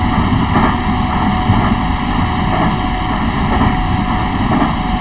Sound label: Run, Train; OpenBVE